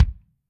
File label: KICK, Drums Percussion